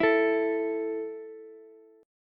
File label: A 5th A down Ukulele, A5 Chord; strummed; JGuitar